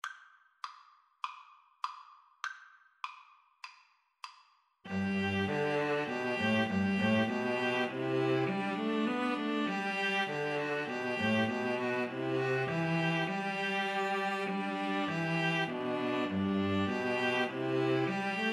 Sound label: angels, String trio